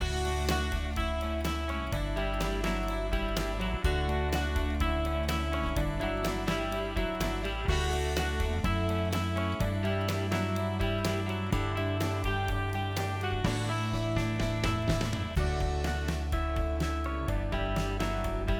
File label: Music